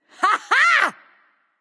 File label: nv yay; smite; awilix